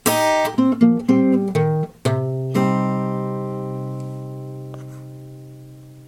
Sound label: a shape minor embellishment lick b, guitar